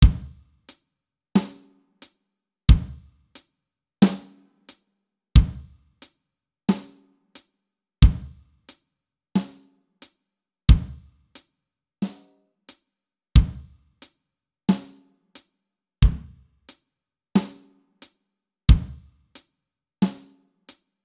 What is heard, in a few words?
Basic Drums 90